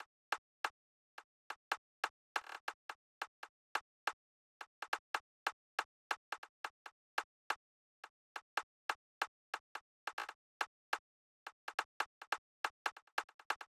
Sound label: Drum Loop - 140 BPM Snare; Cymatics; Mystery Pack Vol 4; Sturdy Drum Loop